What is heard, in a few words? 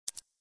Click